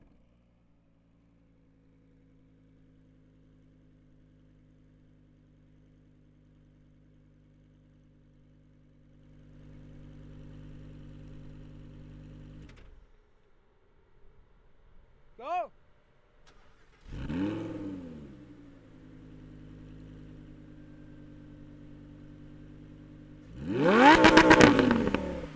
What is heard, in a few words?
Lamborghini Engine Start Up And Kick Down